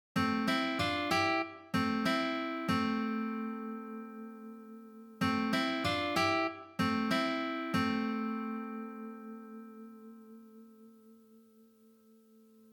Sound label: Riff Harmony